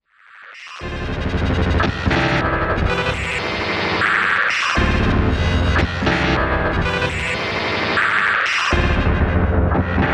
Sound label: MicroFreak; Fors Romb/Ego